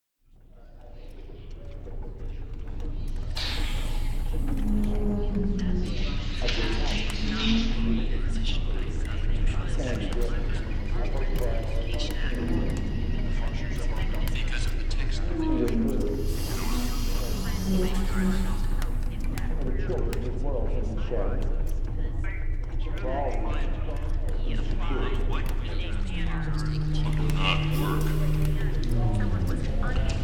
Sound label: soundscape